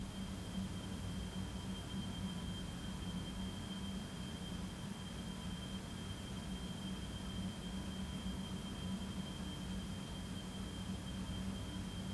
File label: Noise Floor; SFX; Ambient - General